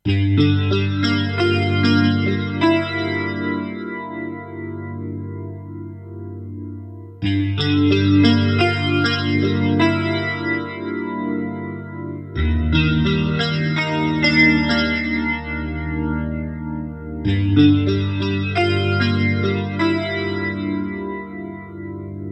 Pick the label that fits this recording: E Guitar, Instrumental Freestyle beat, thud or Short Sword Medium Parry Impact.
E Guitar